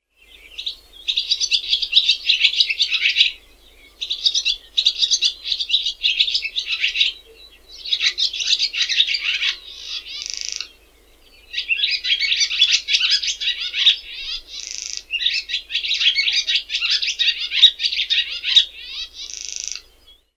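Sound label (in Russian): lastochka derevenskaja, barn swallow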